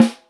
clear snare sample a sharp key, snare one shot tuned to the A# note